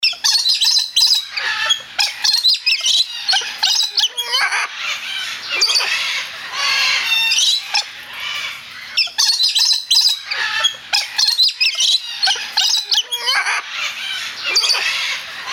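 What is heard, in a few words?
Rose Breasted Cockatoo or Galah, parrot calls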